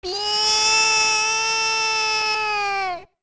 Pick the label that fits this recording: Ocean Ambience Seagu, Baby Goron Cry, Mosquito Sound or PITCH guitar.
Baby Goron Cry